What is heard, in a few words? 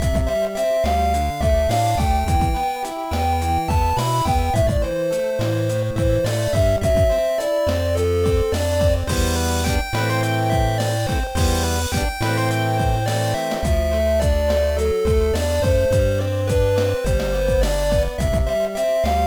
BGM; Dan Doh Xi; GBA